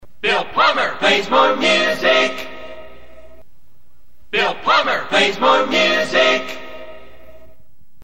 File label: Jingles; KKIS